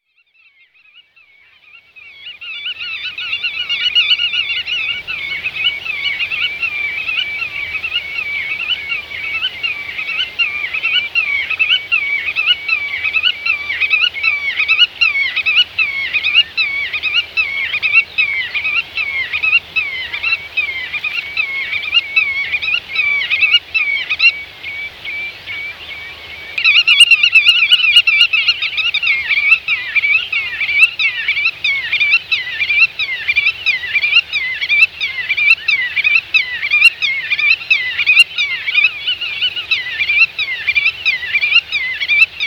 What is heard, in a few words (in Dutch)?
grutto